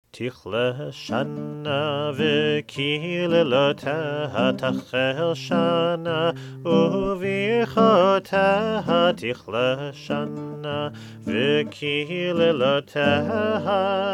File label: guitar; Tichle Shana